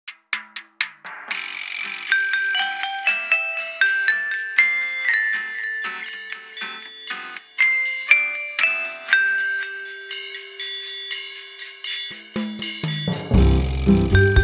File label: Samba